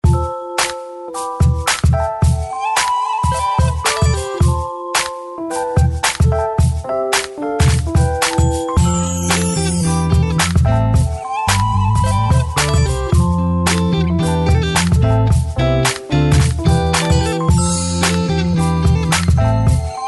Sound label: rhythm blues